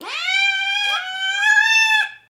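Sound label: Cat Double Meow Squeal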